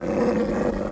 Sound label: Dog mov Attack